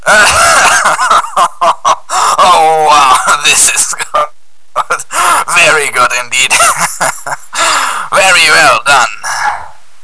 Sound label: radio